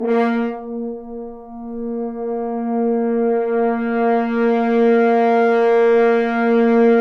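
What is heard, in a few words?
BRS French Horns sfz; Roland LCDP06 Brass Sections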